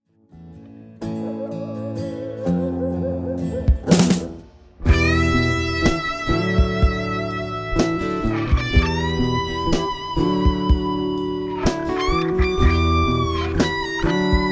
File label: Solo PM doubletracked